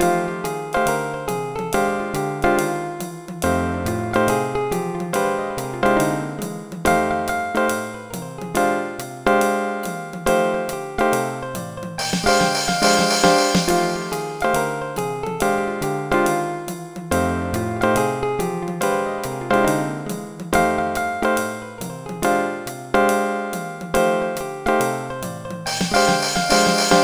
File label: solo; Dr. T's MIDI Recording Studio